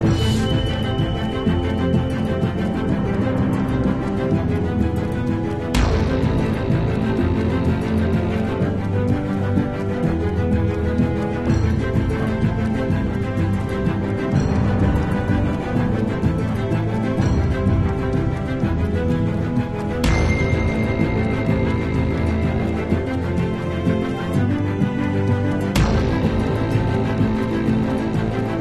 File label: Tense Loop